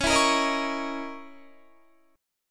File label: C sharp Minor 6th Add 9th C sharp, Guitar; C#m6add9 strummed